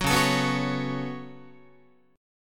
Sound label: Db 7th Suspended 4th Sharp 5th Db down Guitar; 9,9,12,9,10,12; Guitar-4A,4E,5A,5D,5Gsharp,6Csharp; Db7sus4#5 strummed